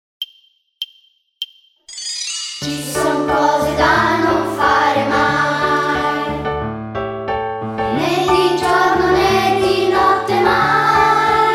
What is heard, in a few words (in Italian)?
melodia